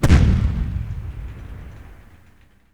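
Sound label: old auto exterior; modern weapons; golgotha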